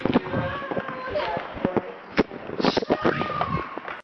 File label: Screaming